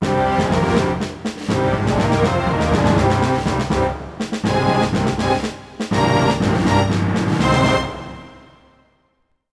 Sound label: Duel Music Lose